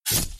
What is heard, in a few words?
spear